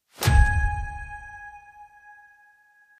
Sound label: transform Blink; finn